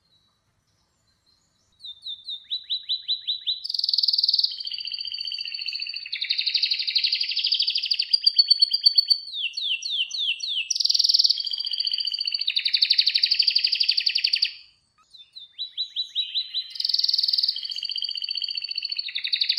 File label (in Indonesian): suara kenari irish fancy